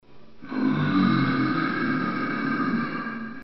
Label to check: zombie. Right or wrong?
Right